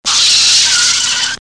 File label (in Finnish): kriik